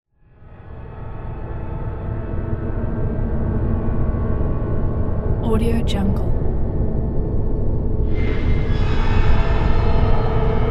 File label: paranormal activity atmosphere